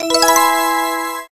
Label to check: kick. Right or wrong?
Wrong